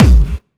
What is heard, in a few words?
Distortion Kicks, Bassdrums